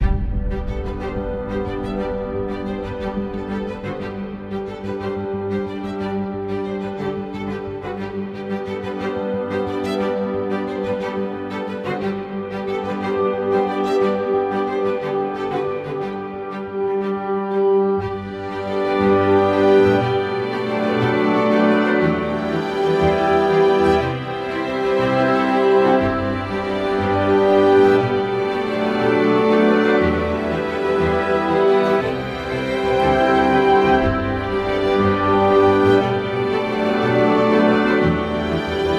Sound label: Ambient